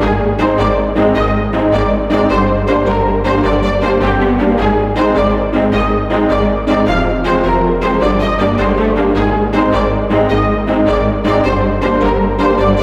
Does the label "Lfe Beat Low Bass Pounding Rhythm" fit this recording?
No